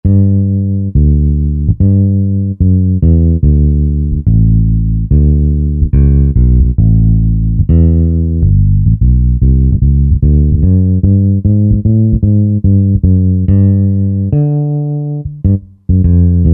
Cmp Finger Bs; Country; Roland SR-JV80-17 Country Collection

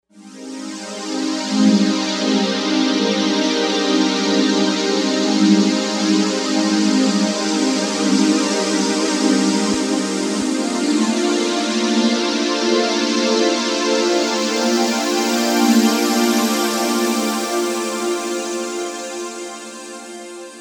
Pad; Novation UltraNova; SuperNova Soundpack Volume 1

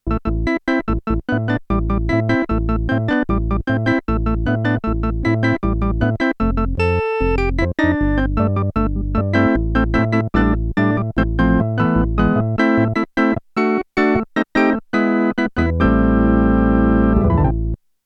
NEW WAVE ORGAN I, Korg DW-8000; Factory Patches

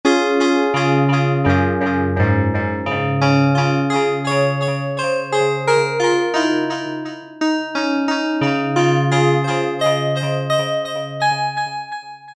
ECHO1, Ensoniq ESQ-1

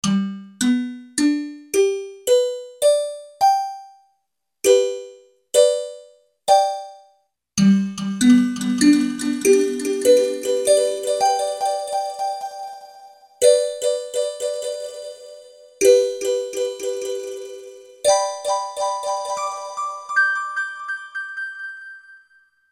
REBOUND DELAY; Boss SX-700; Factory Preset

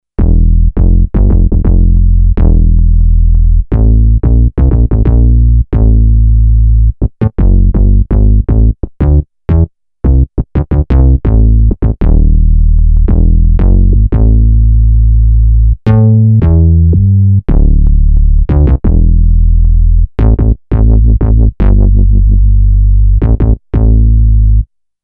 MG Pulse Bass

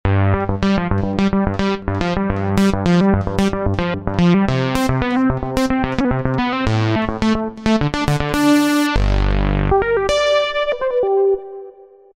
SAWesome Ld, Roland V-Synth; Factory Patches

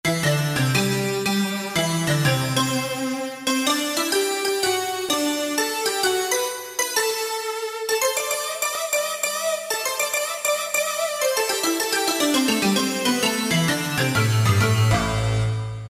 Metallic Lead, Roland PN-D50-03

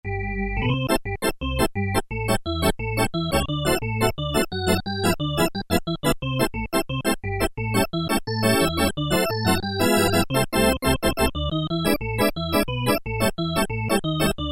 BRIDAL ORGAN, Casio VZ-10M; VZ ROM Card

